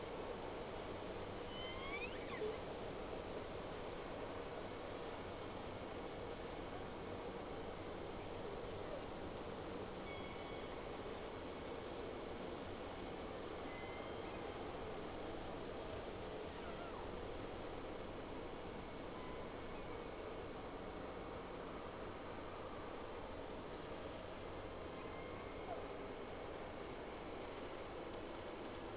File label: Whales; SeaSnake